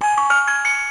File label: Classical Music Notes, toys